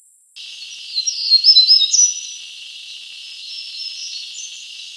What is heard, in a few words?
Black throated gray warbler WA, bird songs of WA calls